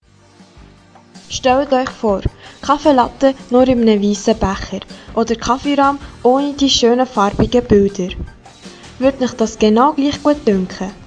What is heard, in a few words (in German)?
Jingle; Nyco flexible packaging GmbH